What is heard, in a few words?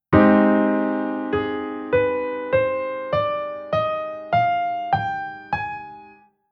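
Chord Scale Minor Harmonic Minor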